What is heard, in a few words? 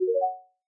match